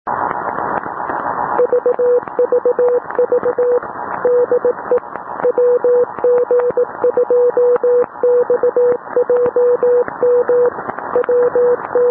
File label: MOPA CW bcn - 474.7; WG2XJM